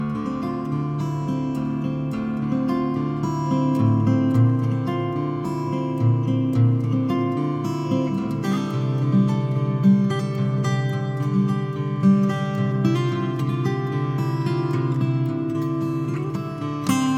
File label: Nokia Mellow